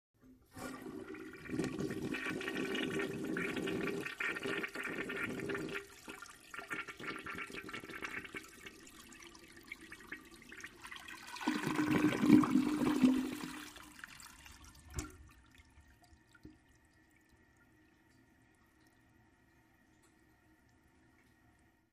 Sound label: Toilet - Public Restroom, Short Flush